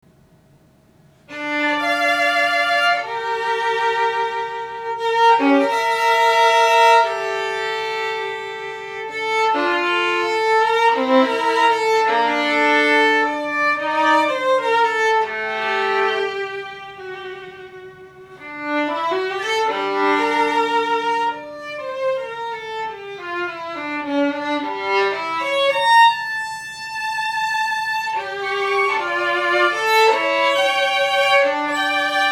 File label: violins; Pallavar